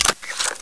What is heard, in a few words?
mp5 clip out, weapons; cstrike